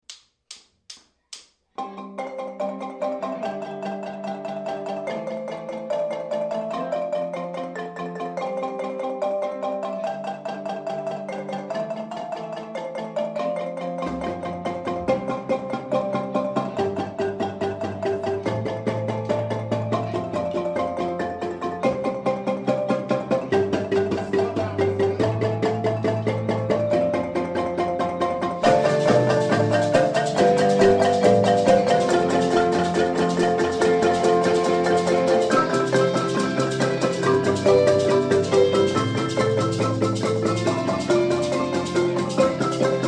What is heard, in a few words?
marimba